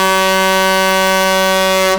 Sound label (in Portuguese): buzina